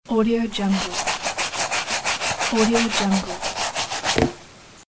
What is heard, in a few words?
brush